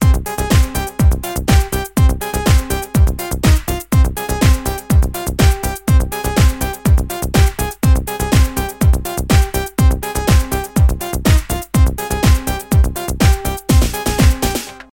Techno pop

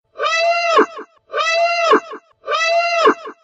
Klaxon; Rouge One

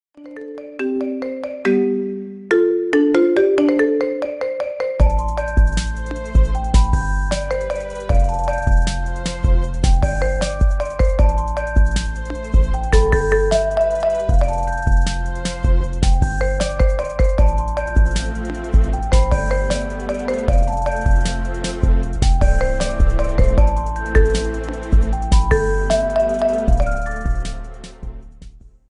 Marimba

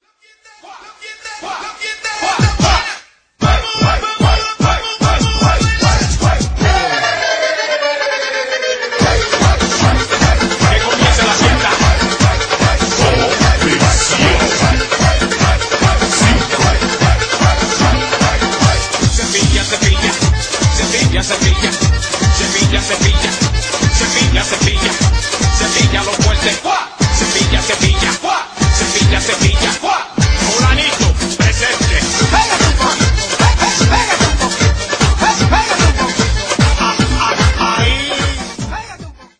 merengue remix

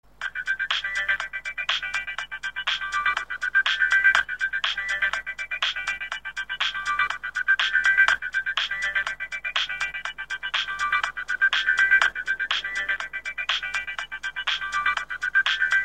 grand ringtone; ringtones

